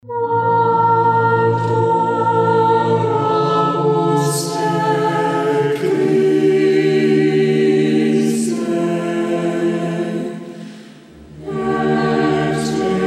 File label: Oratory Choir, Adoramus Te Christe; Cardinal Wright